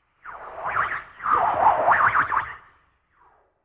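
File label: Swirl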